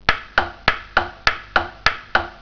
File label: Tick Tack